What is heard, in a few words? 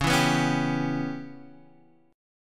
Db Minor 7th Flat 5th Db down, chord; strummed; JGuitar